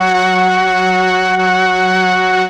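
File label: str f sharp 3, String, MELLOTRON; Retro Sampler; Choir + String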